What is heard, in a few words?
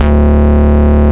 Bass D, Root D